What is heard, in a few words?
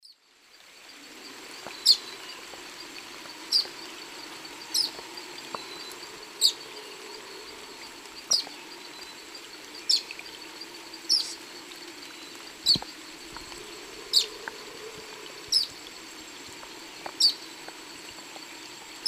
Myiozetetes similis, song; S. America; Eastern Lowlands